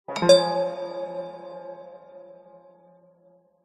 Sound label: prompt sound